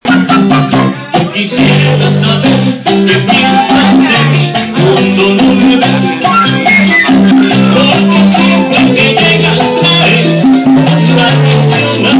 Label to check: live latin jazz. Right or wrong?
Right